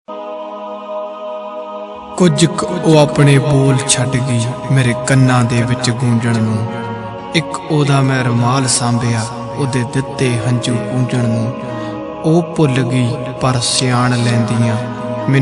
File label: Punjabi Song